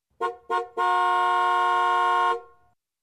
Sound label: Car Honking